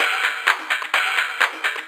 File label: FX Loop 128 BPM, House